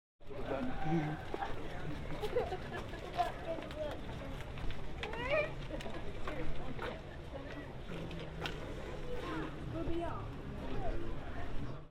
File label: prom; whitstable